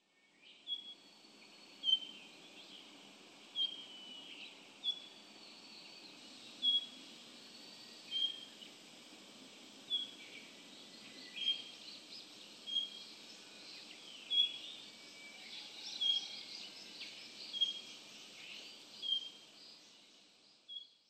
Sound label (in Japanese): isohiyo, hahajima, koe